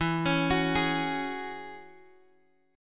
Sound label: E 6th Add 9th E down Guitar DADGAD